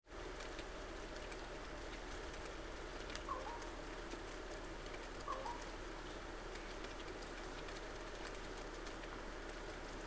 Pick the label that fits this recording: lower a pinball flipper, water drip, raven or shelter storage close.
raven